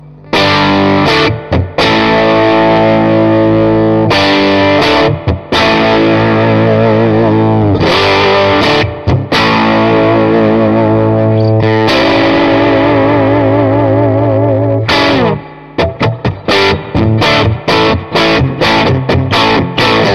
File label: VR Clean vol10, Vibrolux Reverb; Nordström Audio